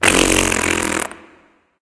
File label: tongue; cupid